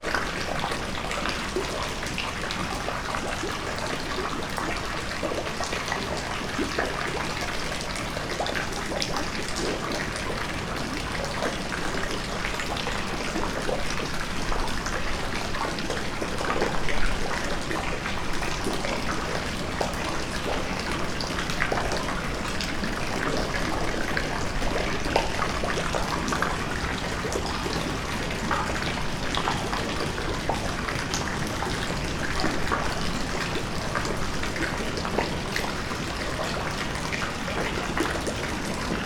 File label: nature rain water pour drip roof into swimming pool